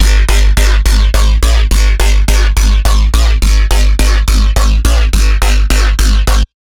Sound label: Bassline C3 140, Dubstep; GhostHack